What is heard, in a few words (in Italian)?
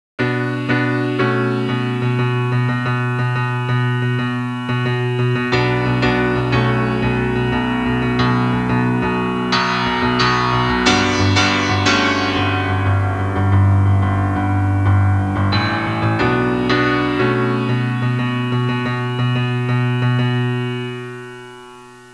musica newage